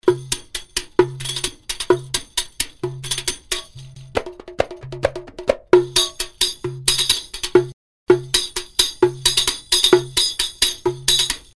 oriental percussion; music elements; grooves

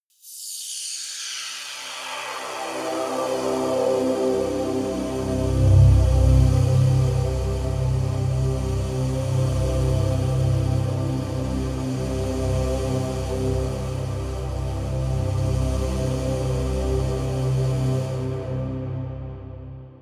pad sweep sizzle; flaechen

